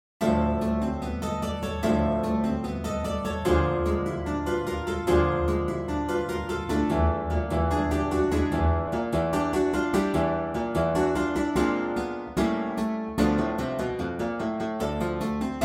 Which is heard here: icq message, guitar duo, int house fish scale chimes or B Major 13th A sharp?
guitar duo